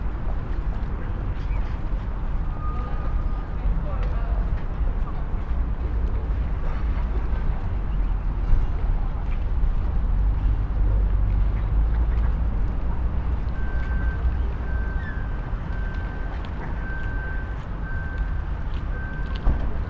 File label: Urban park day people ducks traffic; urban and suburban; boom library